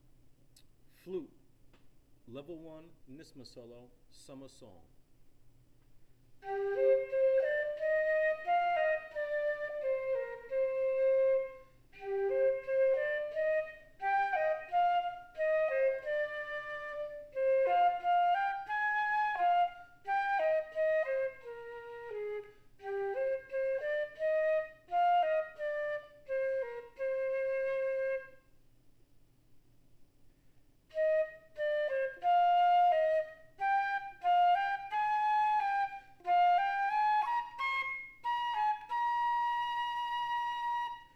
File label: Summer Song Flute Solo; Nyssma